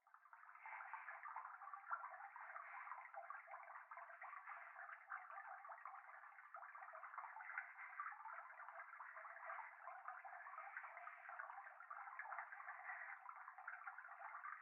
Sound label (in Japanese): 風呂 左耳密着吐息 川のせせらぎ